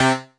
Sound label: DANCE CLAVI; 90 sSampleCDs; Crypton - DrumBase5000